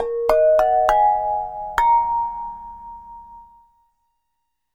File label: Flower Pot; Ambient; Melodic; Ambient-Melodics